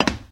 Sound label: glass step; Golden Crayon Sounds